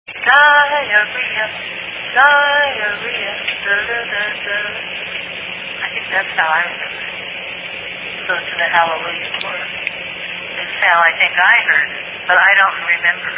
Diarrhea, Tune Hallelujah Chorus, Fragment

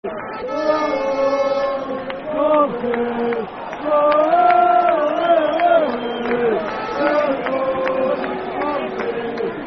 Ole Ole Ole, Football Chant (Soccer Song); Aberdeen Afc

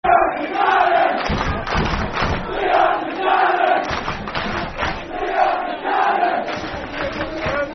Football Chant (Soccer Song); Liam Buchanan; Partick Thistle Ptfc

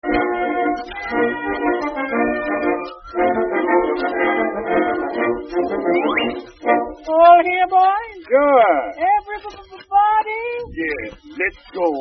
Male Quartet With Orchestra; 1910s; victor records (78)

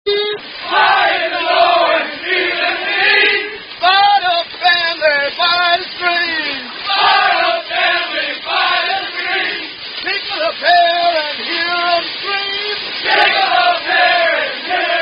Running Cadence; Napalm Sticks To Kids; Tune Sound Off (Aka Duckworth Chant); an officer and a gentleman

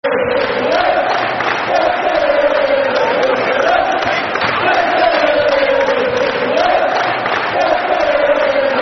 Football Chants (Soccer Songs); Middlesbrough Mfc

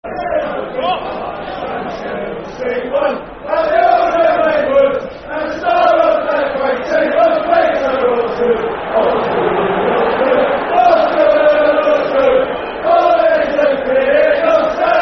Chant Football Chants; Soccer Song; Osgood Chant; Chelsea Cfc

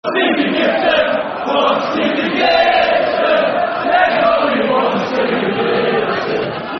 Football Chants (Soccer Songs); There's Only One Stevie Gibson; Middlesbrough Mfc

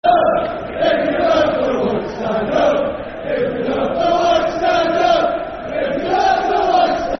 Football Chant, Soccer Songs; Stand Up If You Love The Whites; Hereford United Hufc

